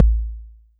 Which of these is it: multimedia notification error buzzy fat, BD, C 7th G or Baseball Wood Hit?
BD